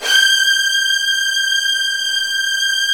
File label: Virtuoso Strings; ILIO; Kirk Hunter Virtuoso Strings VOL-1